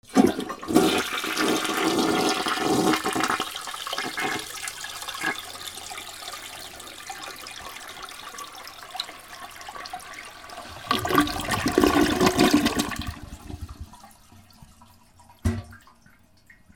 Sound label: BATHROOM